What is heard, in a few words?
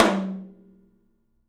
TOM, Studio Toms; 3D Percussives